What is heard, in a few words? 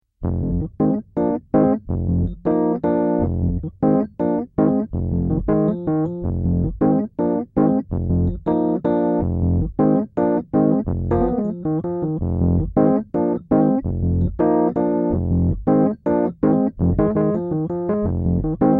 rhythmic mono, fender rhodes